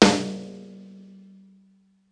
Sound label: rimshot